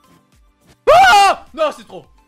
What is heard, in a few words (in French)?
cri de; kaydop